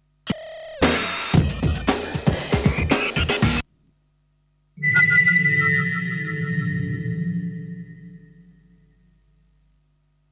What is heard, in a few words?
Sound Effects; Kniteforce; 1000 Samples Volume 1